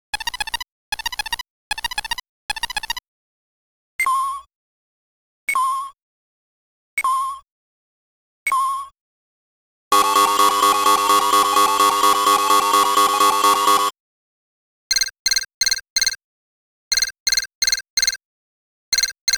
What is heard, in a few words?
Sound Effects - Mechanical Version